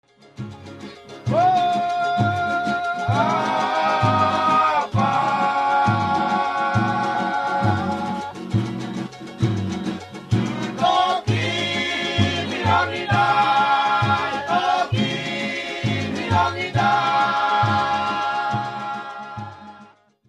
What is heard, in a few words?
string